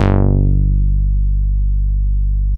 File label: synth bass